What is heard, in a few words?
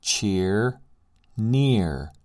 cheer